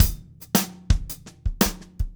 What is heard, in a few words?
shuffle, drumscores